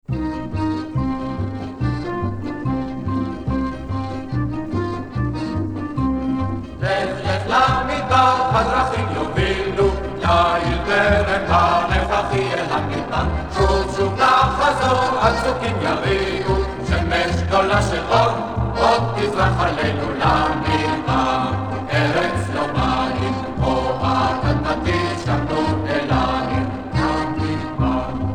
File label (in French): lamidbar chorale israelienne; moadon